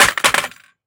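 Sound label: rifle drop concrete; STALKER; IWP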